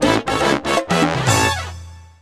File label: jingle med cold; 3ds; Tomodachi Life